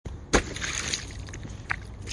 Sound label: puddle